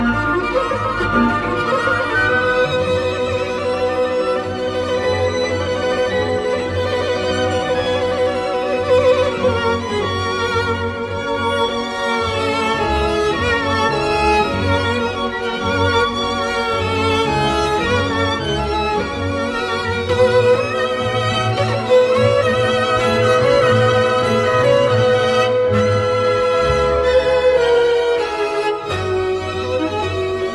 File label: Cello; Dvorak Barenboim Chicago